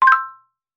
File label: pop